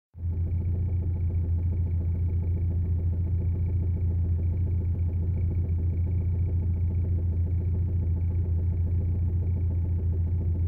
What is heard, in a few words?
put put clack whir knock sounds; saturn s series sedan